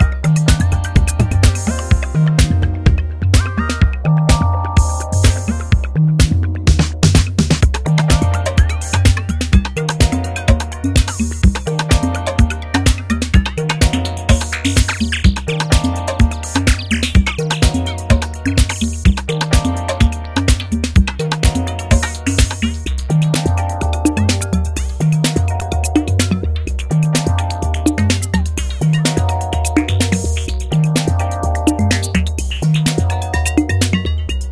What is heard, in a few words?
Analog Bass